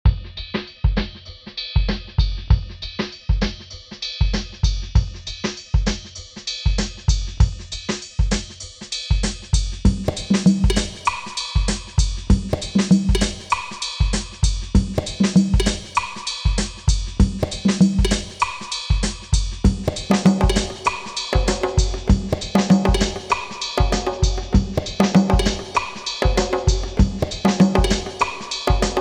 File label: Huawei Cascade Ringtone